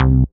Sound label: BASS STER.